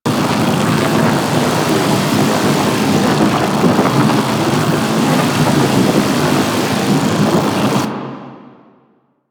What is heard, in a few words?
Octopede Lash Limbs Beam Loop; Warframe; Man In The Wall